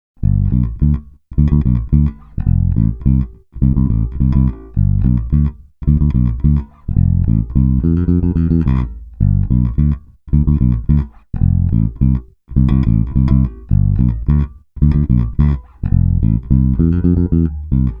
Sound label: JB neck bridge, jbsingle